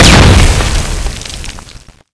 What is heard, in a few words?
explosion small